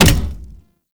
Step Default; Warframe; Corpus; Arachnoid Hunger; Steps

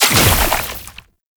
Entangle Slow Down; Warframe; Oraxia

